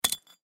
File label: Brick Stack Single, Brick Stack Single Set Down; Rocks; Foley; Blastwave